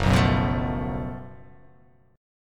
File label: F sharp Minor Major 7th Double Flat 5th, guitar